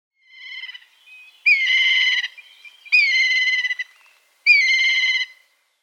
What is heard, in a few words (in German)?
Schwarzmilan Milvus migrans, Vogelstimmen